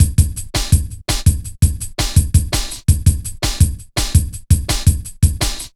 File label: Drum Loops, Jungle; Zero-G Jungle Warfare 1, 2 & 3; Cha 166.5